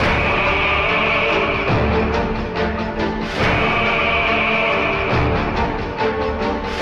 strings, Sad Loops

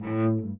cello; String

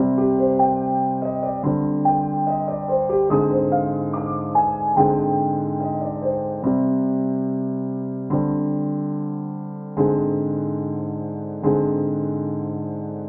piano, Sad

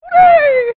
hooray; ooh ahh; human

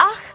ahck; Voice; ooh ahh

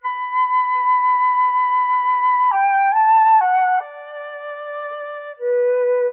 flute unlearning, Loops, Jazzmatazz

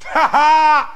"ha ha", Voice

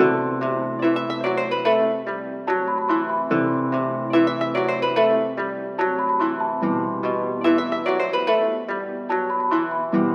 keys vague asian woman, sad loops

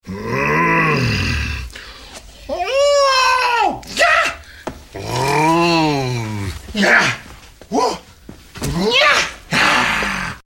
grunts; schwarzy

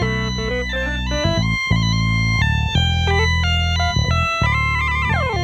keys and guitar funk, phonky loops

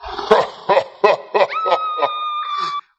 laugh SCREAM; Voice; ooh ahh; beezlebub

